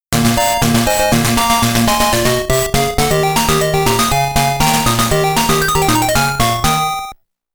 ステージクリア; Nichibutsu Shooting Sound Box

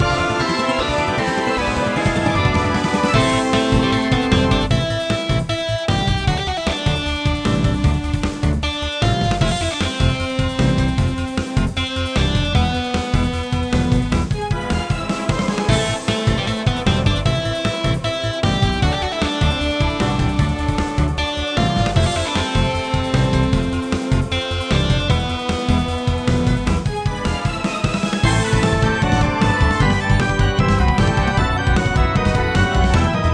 戦えピンボール; Pinball Battlers; Android

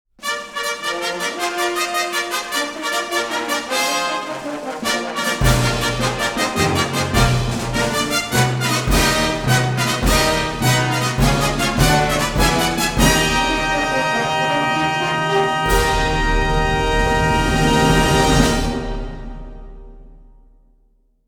ファンファーレ; 大井レース場 東京大賞典; umamusume pretty derby winning live 12 2023

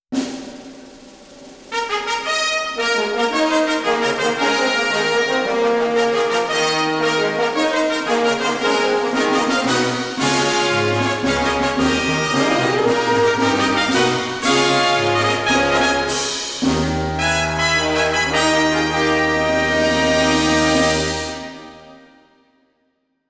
ファンファーレ; 川崎レース場; umamusume pretty derby winning live 12 2023

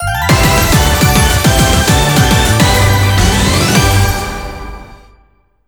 BONUS 入賞ジングル; pachislot girls keirin gi fairy grand prix

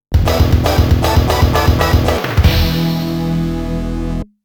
ゲームオーバー; SNK Arcade Sound Digital Collection Vol. 23

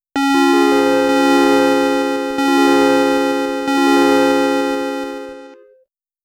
オールパターンクリアゲーム 成功BGM; Nichibutsu Shooting Sound Box

